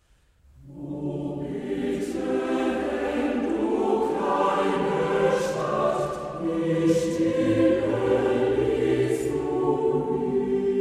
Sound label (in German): Chor